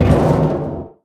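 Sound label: panels impact small, metal; Portal 2; Source Engine; video games; physics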